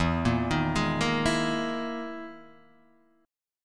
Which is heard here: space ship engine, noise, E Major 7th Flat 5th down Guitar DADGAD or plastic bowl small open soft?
E Major 7th Flat 5th down Guitar DADGAD